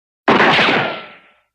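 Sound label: Pistol Interior Shot Ricochet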